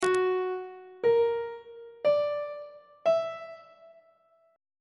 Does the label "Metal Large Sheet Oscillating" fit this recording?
No